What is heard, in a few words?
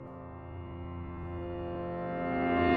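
piano In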